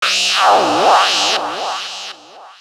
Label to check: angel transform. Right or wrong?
Right